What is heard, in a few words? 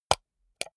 computer mouse wheel click slow hard